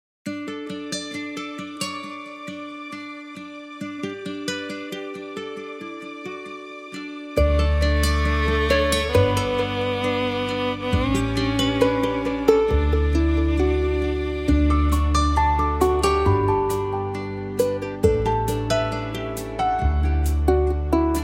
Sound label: Uplifting Harmony Cue